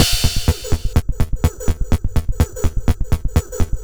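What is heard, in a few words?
percussion, Drum Loops 125bpm, Nu Dance Electro; Zero-G; Soundclash Nu Dance Electro; Acidized Wavs; Krakenhausen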